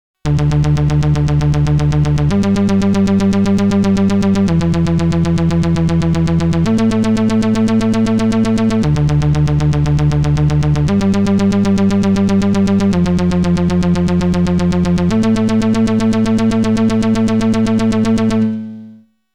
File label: Modal Craft Synth 2.0